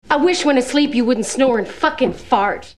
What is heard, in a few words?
snore and fart